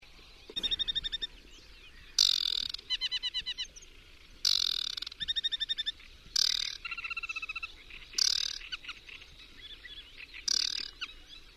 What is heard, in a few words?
Acrola, Liettua; Liettua Dreverna